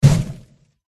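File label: boss squid attack contact bottom; kalmar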